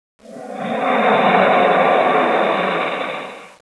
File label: AIRCOMBT; CIV2; WARHAM